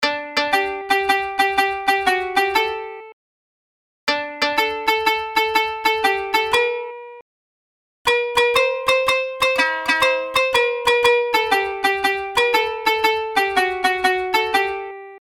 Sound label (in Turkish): kanun melodi